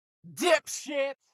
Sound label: car reaction; Blind Drive